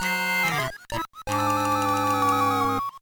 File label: Lose; MPA; Mini-Game Attack